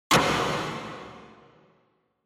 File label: Spotlight